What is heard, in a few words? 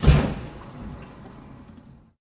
Safety Guard Close, metal gate